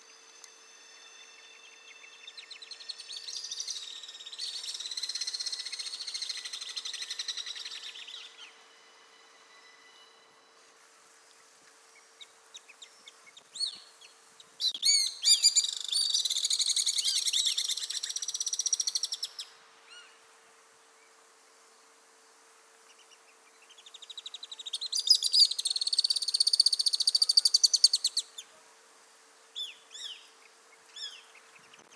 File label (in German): Alpensegler Tachymarptis melba